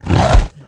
Headbutt, Aliens, Mutant

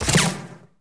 projectile absorbed; impacts explos

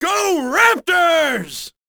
RAPTORS, fan; NBA Inside Drive 2004

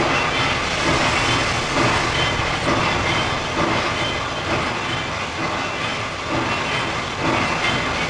industrial ambience; hongkong; Soldier Of Fortune II Double Helix; XBOX

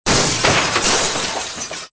glassbreak loop; bullet impacts; Soldier Of Fortune II Double Helix; XBOX

